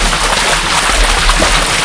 Water; AssaultCube; Alien Stars; American Muscle Series

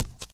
metal grate, footsteps walk; Left 4 Dead 2; boomer

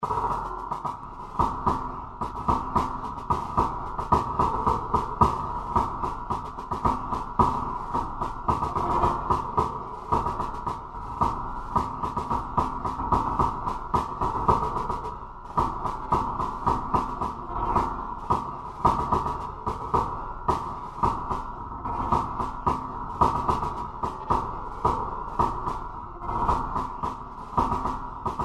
War Drums; Sid Meier's Civilization 4 Complete; Beyond the Sword; Diplomacy

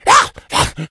become enraged, infected; npc; alert

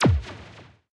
enm shot med; Alawar; Star Defender 4

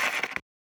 click chat; Hearts of Iron IV Death or Dishonor

